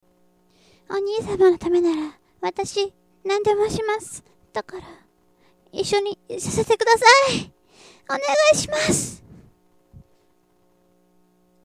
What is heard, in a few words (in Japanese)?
nakijakuri